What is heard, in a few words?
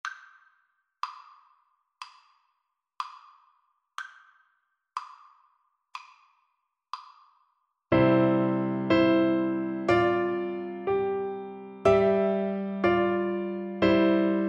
ode to joy, Trombone